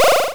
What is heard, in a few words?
power up; brackeys platformer assets